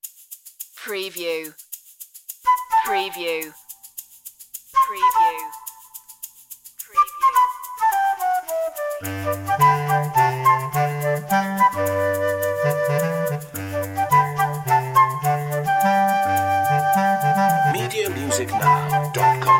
childrens calypso

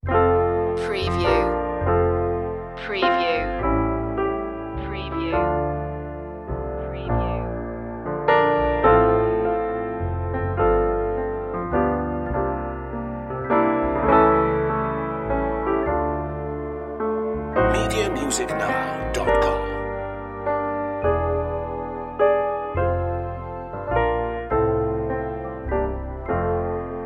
gospel piano

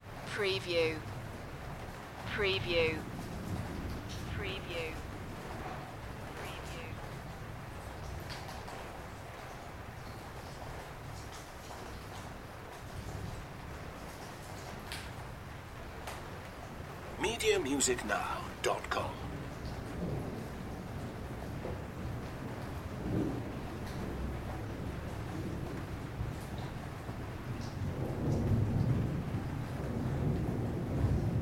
thunderstorm ambience; Weather; Weather / Thunder / Storm / Lightning

